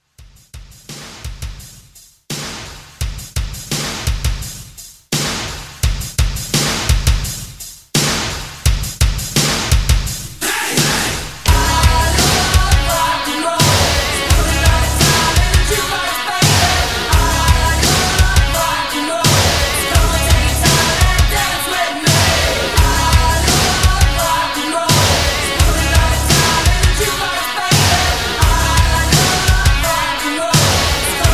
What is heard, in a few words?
80s Hard Rock Hype Re-Drum 85